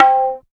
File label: d1, Tabla + Sitar, MIRAGE; ProSamples vol.55 - Retro Sampler